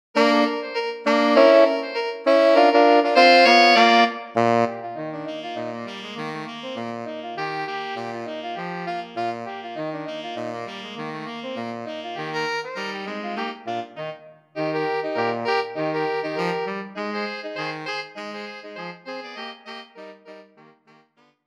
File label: Turkey In The Straw Sax